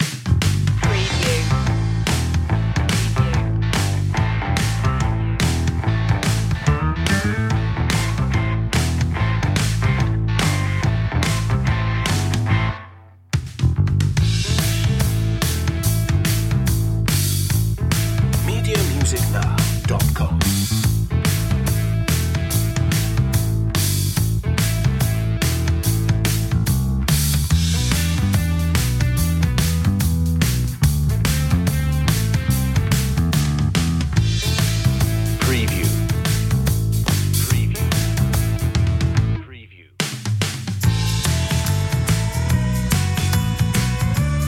rusty gritty southern rock no lead guitar

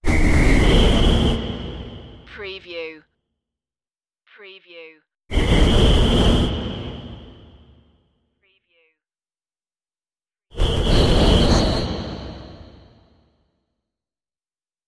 blow away blasts

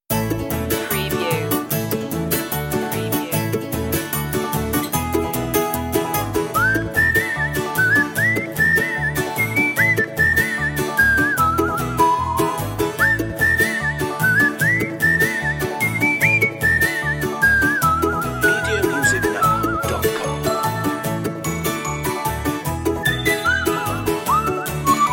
just for funsies no drums

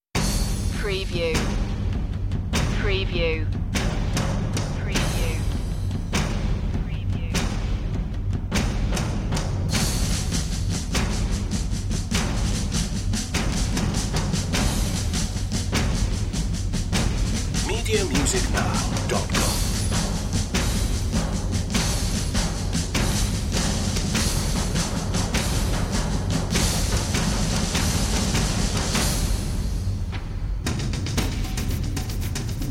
percussion

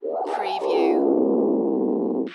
Cat Short Growl

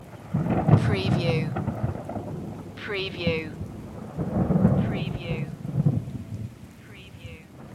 thunder stereo loop

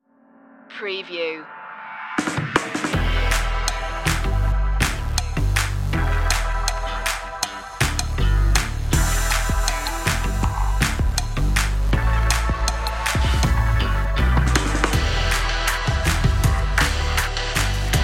no lead

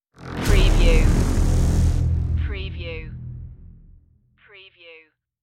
hybrid trailer stinger

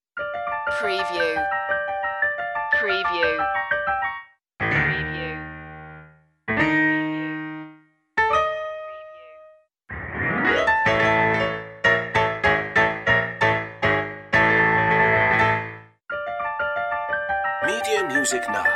silent movie villain

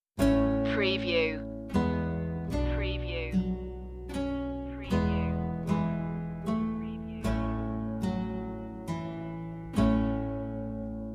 tender touch romantic guitar

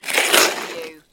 Snow and Ice, Ice slide pass by

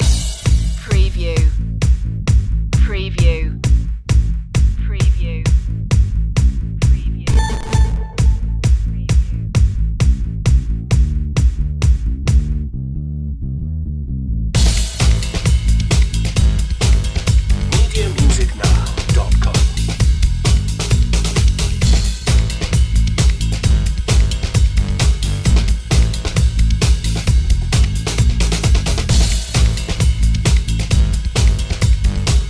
retro video game underscore

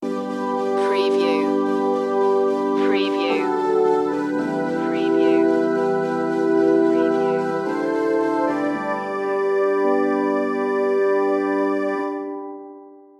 sun in your face stinger

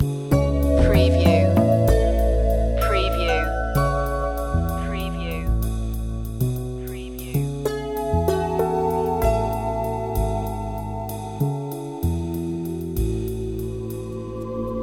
loop, chill

